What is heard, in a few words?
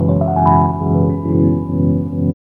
RHODES